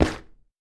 Cement

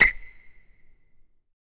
Basketball Court

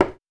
High Heel

